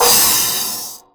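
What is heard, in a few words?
Large Crash, DIGITAL KIT; Roland MV8800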